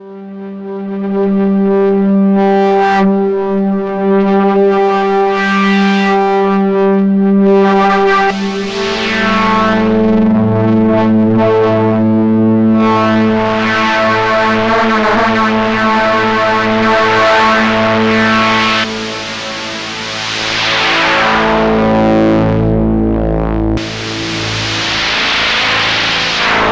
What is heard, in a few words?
noise etude scammer scanner